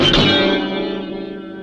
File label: string smash; weapons